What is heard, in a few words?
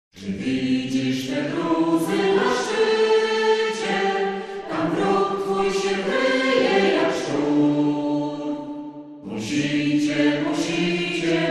acapp